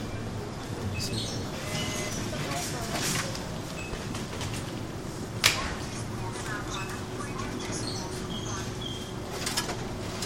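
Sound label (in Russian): торговый центр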